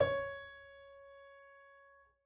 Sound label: piano c1#; Golden Crayon Sounds